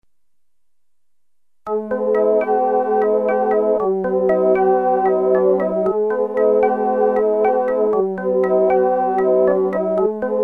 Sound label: electric organ